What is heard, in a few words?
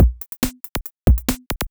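DRUMLOOP ELECTRO 140; Techno2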